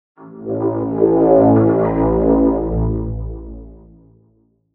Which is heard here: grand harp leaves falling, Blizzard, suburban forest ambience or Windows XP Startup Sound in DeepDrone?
Windows XP Startup Sound in DeepDrone